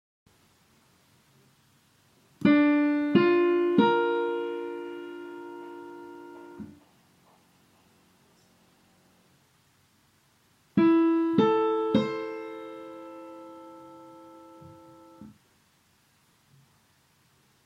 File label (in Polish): trojdzwieki